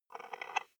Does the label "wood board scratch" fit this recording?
Yes